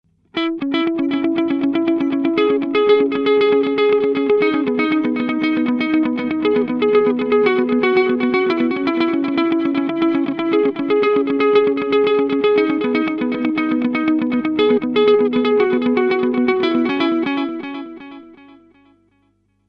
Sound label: Strymon DIG Digital Delay